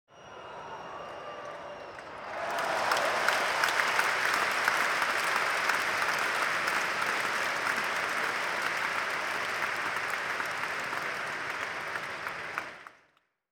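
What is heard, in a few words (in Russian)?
аплодисменты 6000 человек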